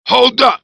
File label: hurt, grunt